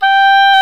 Oboe, Orchestral Winds

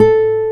G A3, GUITAR; AKAI S-Series CD-ROM Sound Library VOL-8; SET#1 GUITAR

